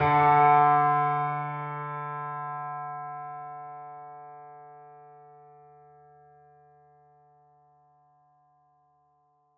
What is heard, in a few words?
piano theme modular hypothesis; The Detail ios linux macos windows gamerip 2014